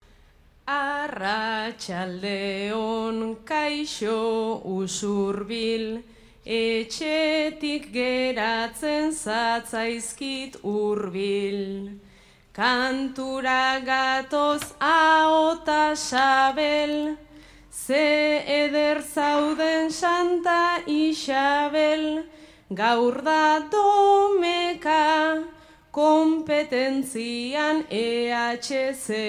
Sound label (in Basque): agurra, bertsoa; usurbil